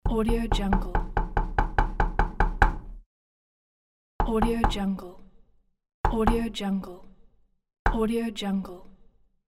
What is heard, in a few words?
knocking on glass